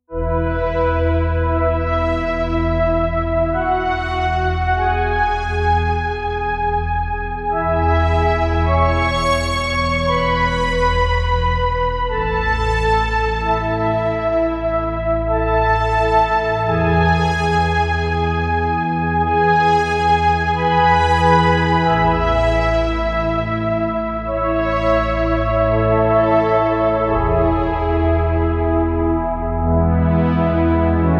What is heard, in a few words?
Nord Grand Big Polysynth